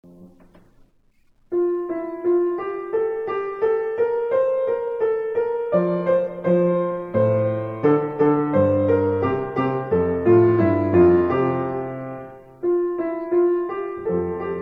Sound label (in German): Hirtenmelodie